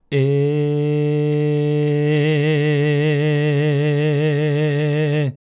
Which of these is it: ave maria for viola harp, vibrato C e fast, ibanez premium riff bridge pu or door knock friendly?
vibrato C e fast